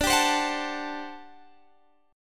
G Major 7th Suspended 2nd Suspended 4th D

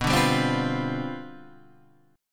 C Major 7th Suspended 2nd Suspended 4th B, chord

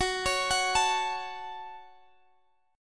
Gb Minor, Guitar, Half Step Down

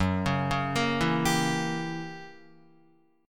Gb 7th Flat 9th Gb Guitar; arpeggiated; JGuitar

